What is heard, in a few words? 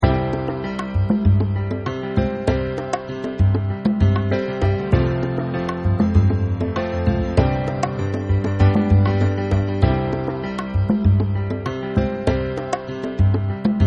classical backing track